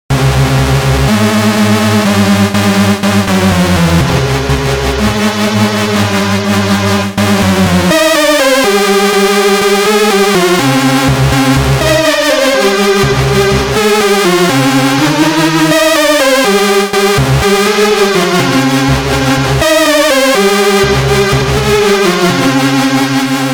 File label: lead